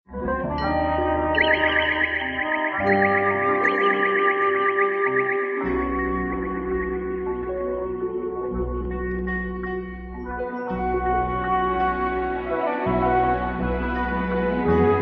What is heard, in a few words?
vengeance flute and strings